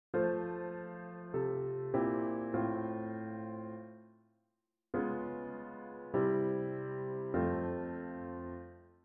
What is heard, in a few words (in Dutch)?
gealtereerd, gealtereerde akkoorden